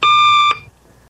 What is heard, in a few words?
annoying beep